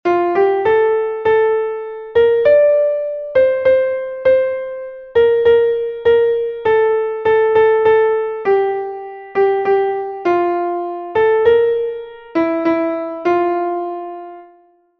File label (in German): klavier; MelodieMeister